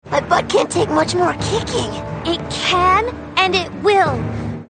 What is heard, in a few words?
kicking; teentitans